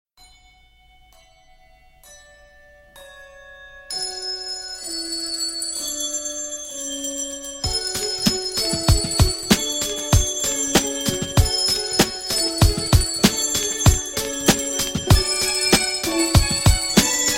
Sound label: Orchestra, Remix